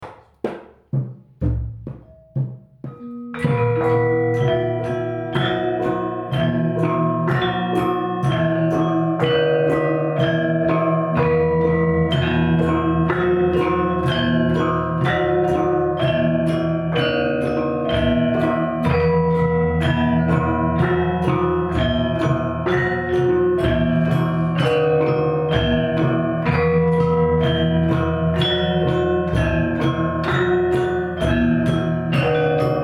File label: Gamelan